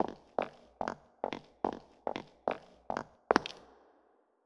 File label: footsteps